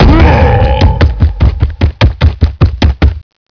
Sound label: bull rush